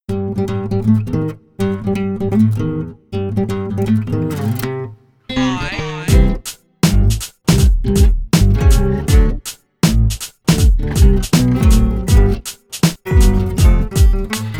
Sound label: Ragtime